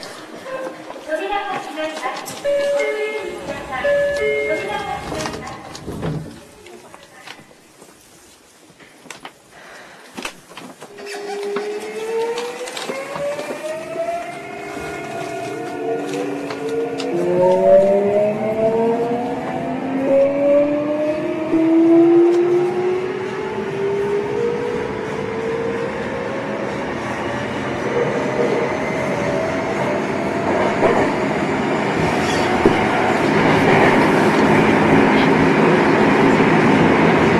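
variable-voltage variable-frequency inverter, Mitsubishi; eidan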